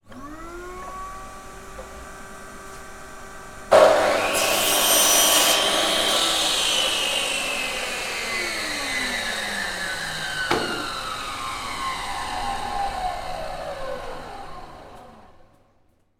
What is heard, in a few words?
industrial power tool circular saw on operate short off